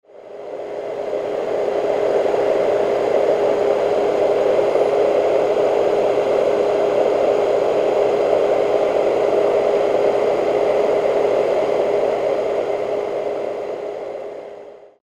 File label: Vintage Sleep Machine Noise; Marpac; sleep noise machine ambience